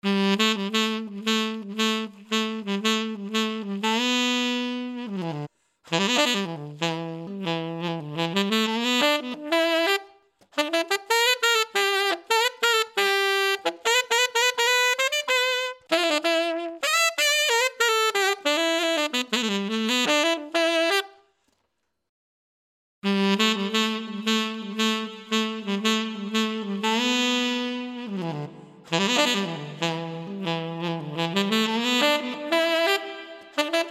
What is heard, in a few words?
Vergleich Hall V2 Large Hall